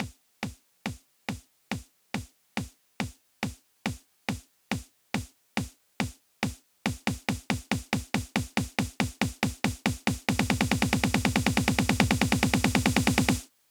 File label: Devastating Truth Kit 140BPM Snare Roll; Gminor; Vengeance Samples; VTS1 Devastating Truth Kit